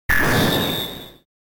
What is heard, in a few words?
Key Obtainment Jingle; Metroid Prime Hunters